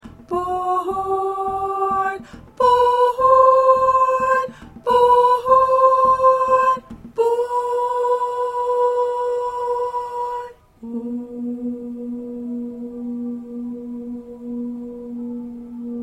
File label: Alto 1